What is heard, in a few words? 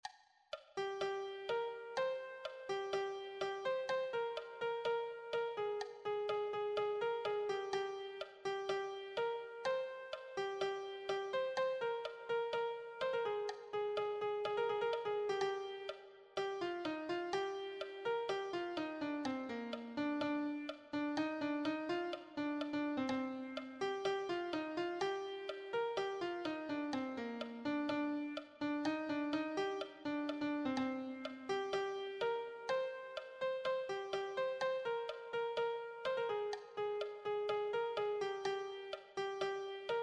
melody, piano